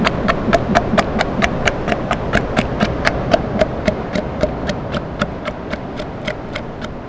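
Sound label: Australian sea lion in air male bark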